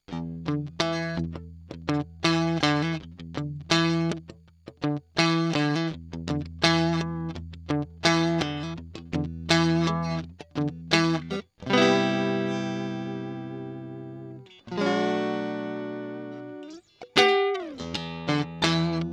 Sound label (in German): DI Aufnahme EQ 6 kHz an DI Signal